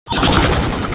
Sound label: pain; svencoop; portal house